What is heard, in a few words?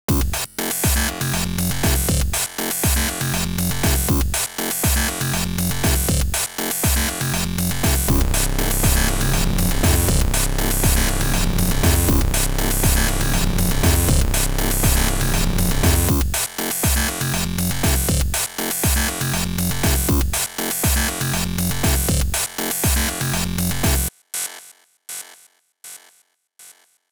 driving, music; grunk